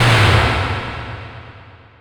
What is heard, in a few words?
explosion; anvil